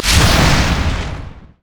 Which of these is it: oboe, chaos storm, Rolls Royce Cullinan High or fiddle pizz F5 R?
chaos storm